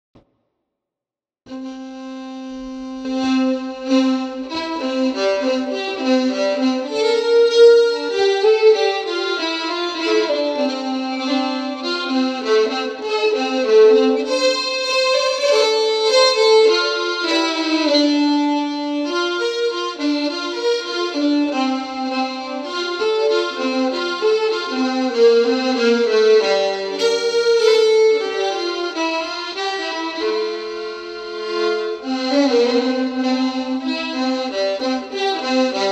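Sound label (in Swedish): Polska, säckpipa; Gelotte; roslagens spelkmanslag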